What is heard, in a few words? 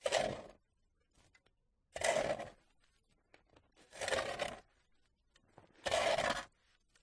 shovel clearing snow from path sequence; toolmisc; field reports